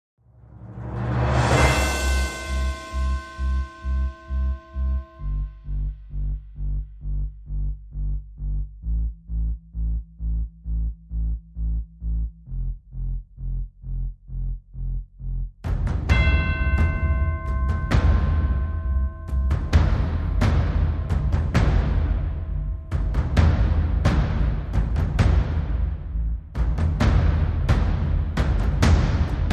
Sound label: Drums and Bass